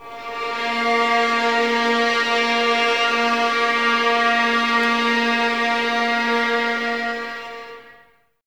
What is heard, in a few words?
Violins Sul Ponte, String Ensembles; Miroslav Vitous; 11 Violins